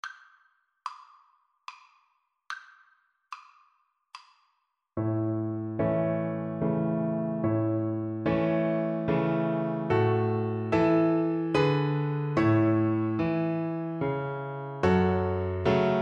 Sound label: Viola